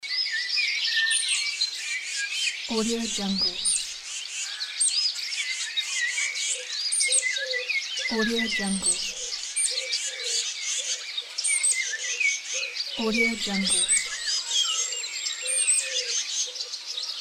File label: birds; kuksaudio